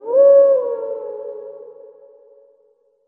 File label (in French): loup spawn